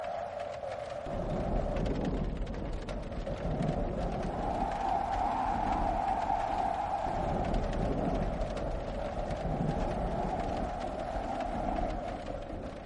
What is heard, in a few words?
Wind Sound